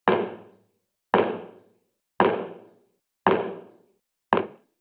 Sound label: balloon